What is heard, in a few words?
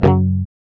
GUI F#, Acoustic; ACOUSTIC 2